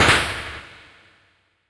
normal damage; pokerogue

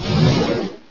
Shell Trap; battle anims; PokeRogue

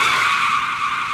drifting; Mario-Kart-3.js

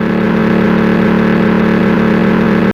engine; Mario-Kart-3.js

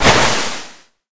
Water, battle anims; pokerogue

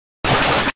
Fury Swipes; battle anims; pokerogue

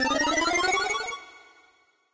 increase; pokerogue

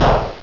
Blaze Kick; PokeRogue; battle anims

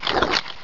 Storm Throw; PokeRogue; battle anims

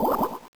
bubble; PokeRogue

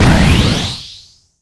turbo; Mario-Kart-3.js

